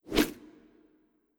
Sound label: Human Hunter Throw; Legend of Keepers